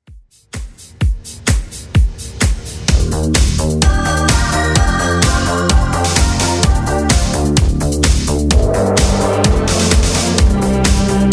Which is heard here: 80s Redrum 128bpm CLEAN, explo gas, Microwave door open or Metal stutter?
80s Redrum 128bpm CLEAN